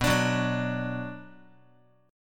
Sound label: B Minor B Guitar; strummed; JGuitar